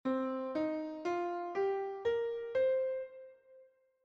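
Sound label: Penta, Pentatonic scales, bells; Penta 2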